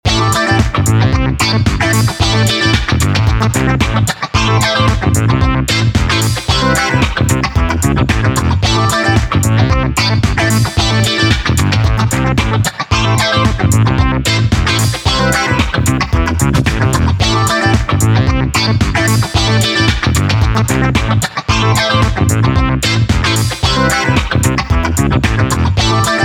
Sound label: Brass Acid Disco